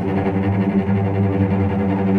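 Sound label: cello tremolo G2 mezzo forte left, string; East West - The Ultimate String Collection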